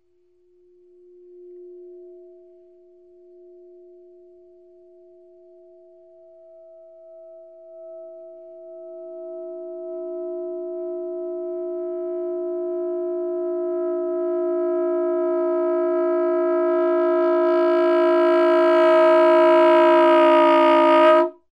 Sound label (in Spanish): Morphing